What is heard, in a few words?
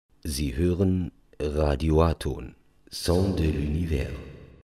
Jingle